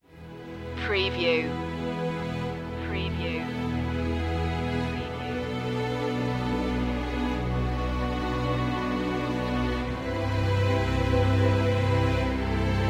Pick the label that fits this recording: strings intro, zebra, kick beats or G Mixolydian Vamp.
strings intro